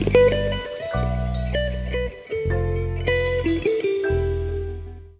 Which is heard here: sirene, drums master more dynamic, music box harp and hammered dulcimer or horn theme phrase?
horn theme phrase